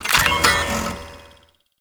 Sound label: Hack Minigame Boot Up; Entrati